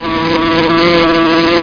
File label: Wasp buzzing